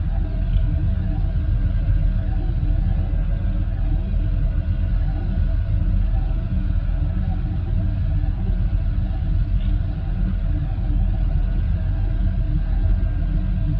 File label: destroyer hum, ambient; Stellaris